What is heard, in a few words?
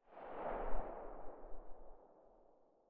silencer mono very far, weapons